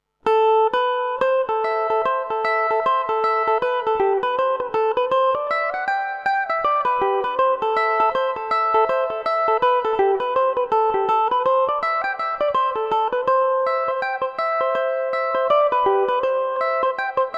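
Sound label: A dorian revelation, Irish mandolin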